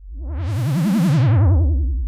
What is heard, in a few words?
Blackhole create; Megaplane